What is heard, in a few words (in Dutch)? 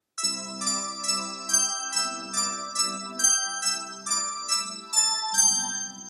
Muziekfragment Nederlandstalig